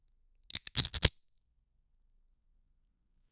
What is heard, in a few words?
coconut being split open